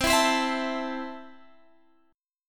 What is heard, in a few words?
C 6th, C, down, Guitar, Standard; strummed; capo=2